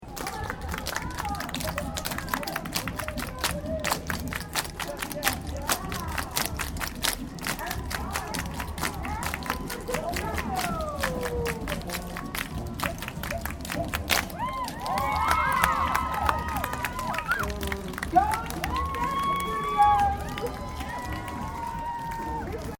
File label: Ambience Melbourne Moomba Parade Tap Dancing Group Stereo, Parade; Moomba festival Melbourne 2009; Parades